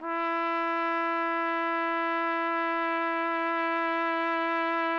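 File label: TPT, trumpet; Roland - LA Composer vol. 3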